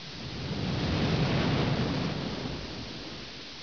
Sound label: ocean waves